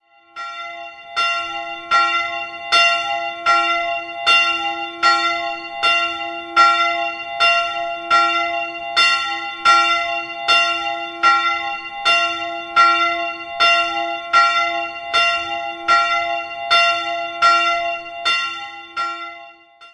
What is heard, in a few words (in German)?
Baar Ebenhausen Werk Dreieinigkeitskirche, glocken